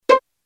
PCM Pizz, Roland D-50; Waveform demos

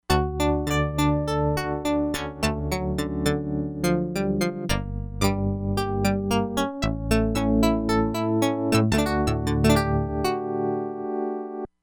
DIGITAL PIANO, Crumar Bit 99; Factory Programs

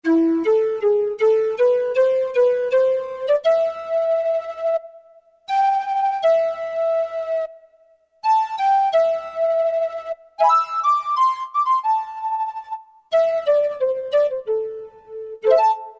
Mtn Pipes, Alesis Z7 Latin

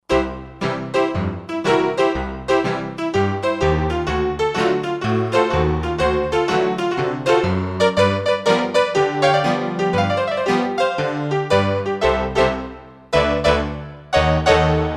Honky-tonk, Roland JV-2080

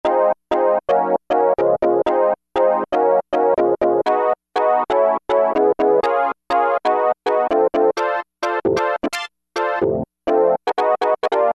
hit Rip Hit; E-mu Orbit V2; Factory presets

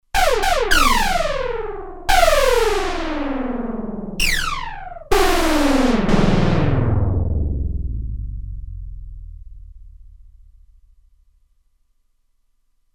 Arcade, Roland Alpha Juno-2; Factory 2

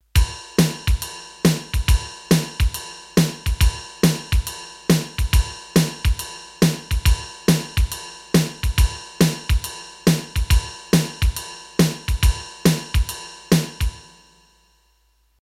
Jazz, Roland D-10; Rhythm Demos

